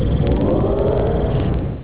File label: light up